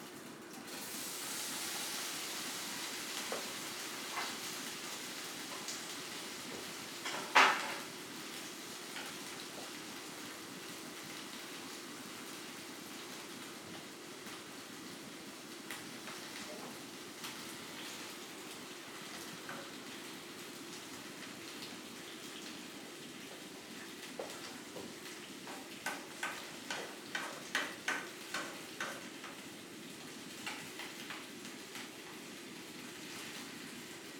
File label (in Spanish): Ambiente Cocina Domestica